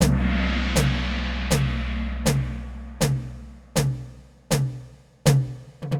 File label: Orchestral Drum Beat